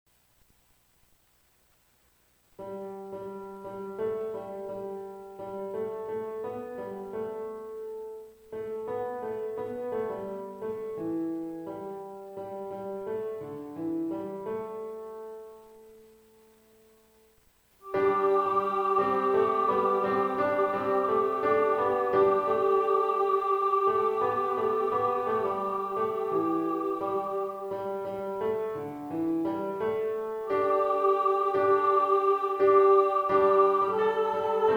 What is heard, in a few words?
Soprano, choir